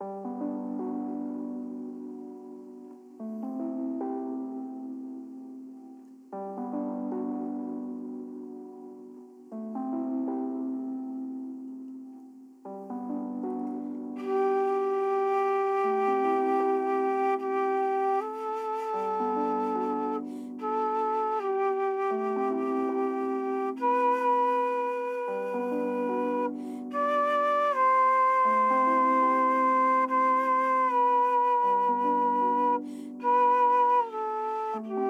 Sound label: outside mountain theme